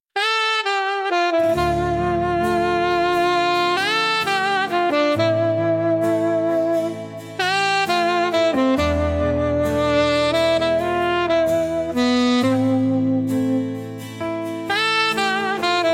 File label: sax